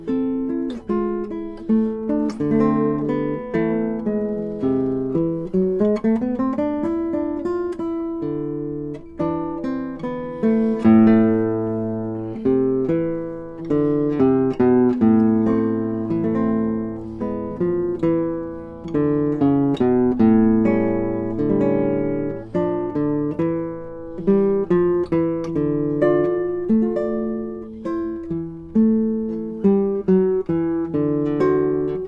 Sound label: gitara, Neumann KM-184 stereo